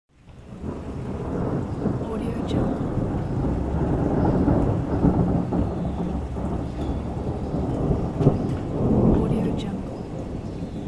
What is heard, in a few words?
grumbling thunder